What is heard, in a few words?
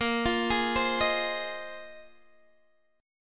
Bb 7th Suspended 2nd Suspended 4th, Guitar, Open G